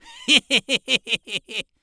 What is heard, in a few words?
evil laugh; human sounds; شیطانی